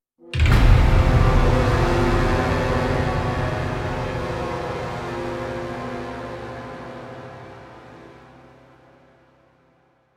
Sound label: Closing Bell